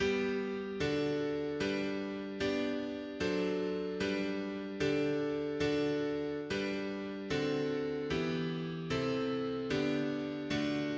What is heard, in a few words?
mono piano, hymns; Cest toi Jesus